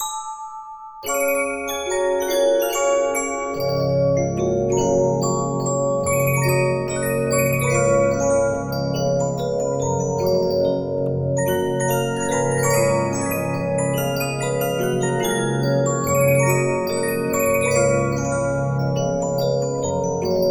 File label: Regina, 15inch disc musicbox